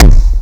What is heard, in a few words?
bail body punch; skater; Tony Hawk's Project 8; XBOX